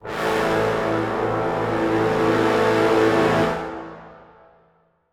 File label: death crash; Zomboid 41.78.16; Project Zomboid